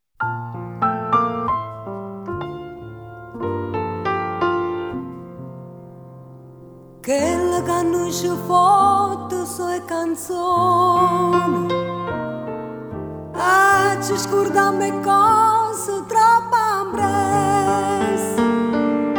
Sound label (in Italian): piano e voce